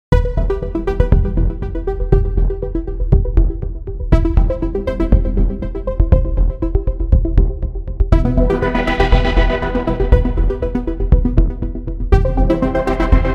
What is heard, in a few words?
pad electronica; poly cre8